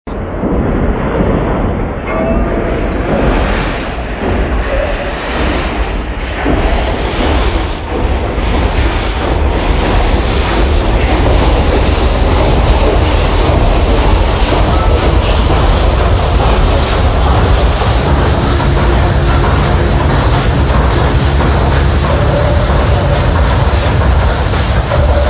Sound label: Steam Engine